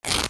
RIPPING

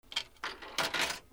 PHONES

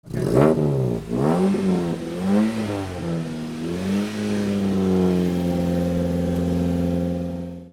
CARS

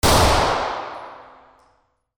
WEAPONS

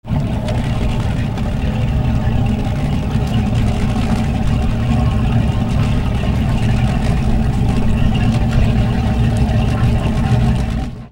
BOATS

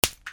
BREAKING

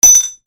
MONEY

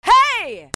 PEOPLE

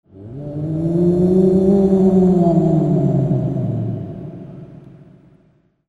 CARTOON

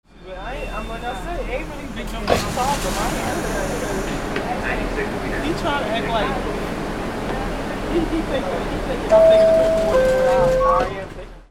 AMBIENCE

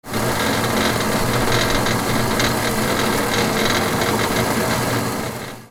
KITCHEN

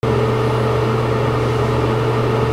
BATHROOM

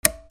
CLICKS

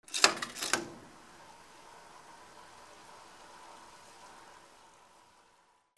INDUSTRY

